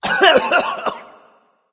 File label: clearthroat; cuchulainn